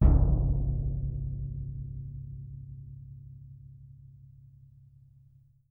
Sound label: B D, Percussion; Miroslav Vitous - Percussion & Harp